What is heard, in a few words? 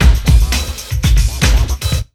ROCK 132, Big Beat Drumloops